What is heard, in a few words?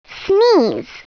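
SNEEZE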